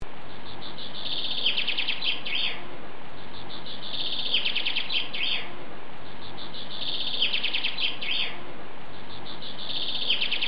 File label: back garden bird, birdsong